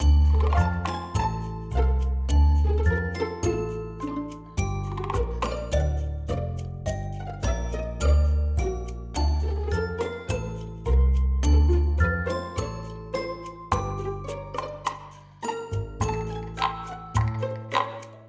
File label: Living room theme; Toy Odyssey The Lost and Found